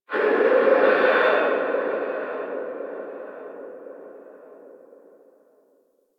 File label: Stadium Infested Far